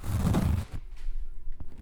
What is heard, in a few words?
Course Fabric; BB Retail Therapy Sample Pack